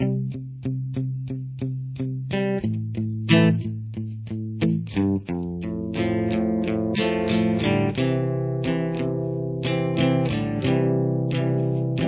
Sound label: chorus acoustic, guitar